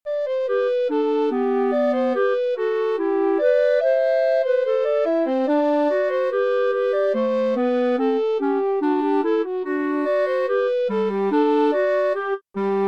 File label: Great Duets TREBLE Bold Princess